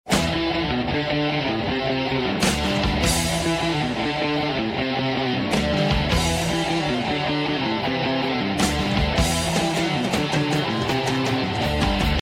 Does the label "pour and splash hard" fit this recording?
No